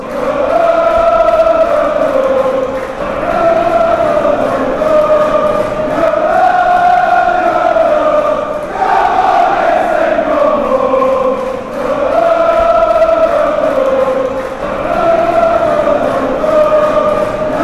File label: genk chant